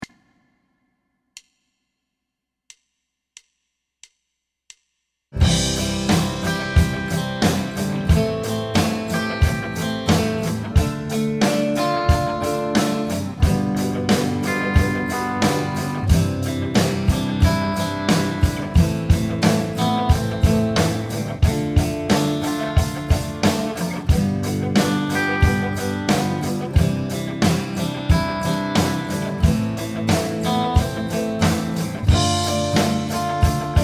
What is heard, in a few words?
Rhythm Changes Rock 90 C; Bass Practice Tracks